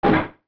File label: Log Bounce